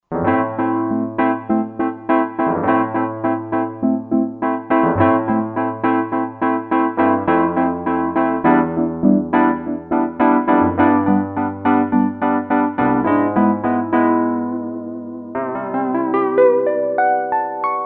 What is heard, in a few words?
Tramp Wurly, Roland SR-JV80-08; '60s & '70s